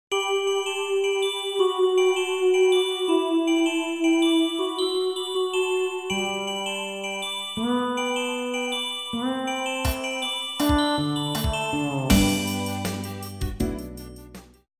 campanas

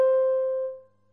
Do aguda

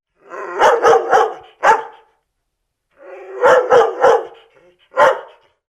perro

